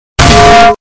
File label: loud frying pan